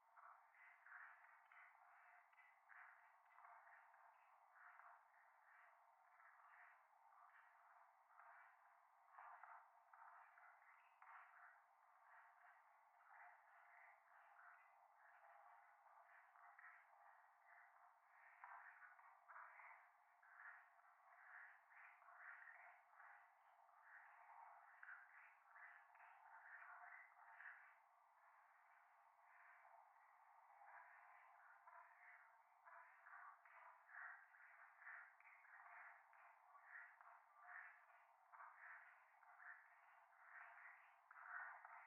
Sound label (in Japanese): 夢の中 フェラチオ 電車